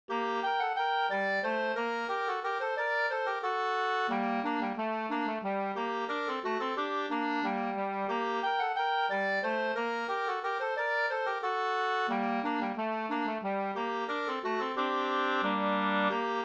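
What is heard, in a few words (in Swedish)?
slangpolska, two-part; Stigtomta Sd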